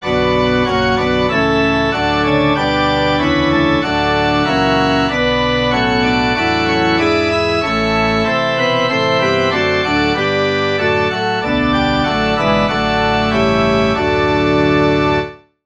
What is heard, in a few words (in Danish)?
forspil; ddk 157 gud du som lyset og dagen oplod